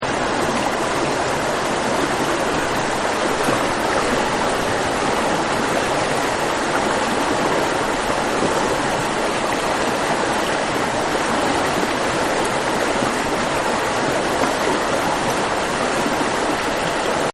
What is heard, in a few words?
Spring Water; Lakes And Ponds; Nature; Partners In Rhyme